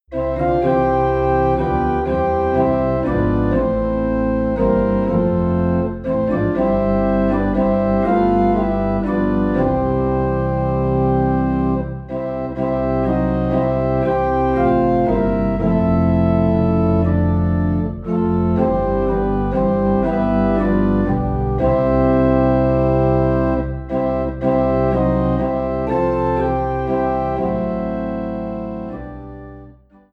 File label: Organ Low in C; Turn Your Eyes Upoin Jesus